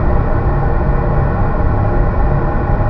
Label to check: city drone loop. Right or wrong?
Right